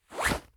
Blinked Sleeve, Clothes

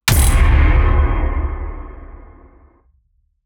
Tension Builder Impact

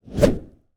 Swing

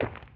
Footstep

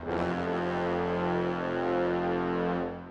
Dramatic Brass

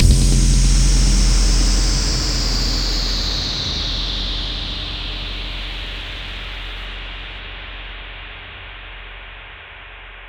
Impact Fluctation High Strong Echo; Impacts Cinematic

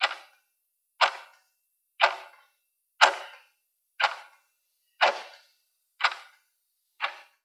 Tension Builder

